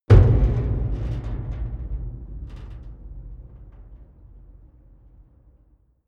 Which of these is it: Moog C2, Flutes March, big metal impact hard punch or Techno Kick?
big metal impact hard punch